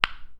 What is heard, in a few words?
Collision Sound Effects Clanking Sound Short Sample